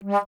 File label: Alto Flute, Solo Instruments